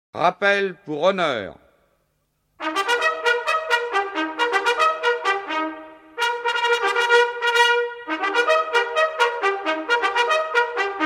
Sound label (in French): trompette de cavalerie; rappel pour honneur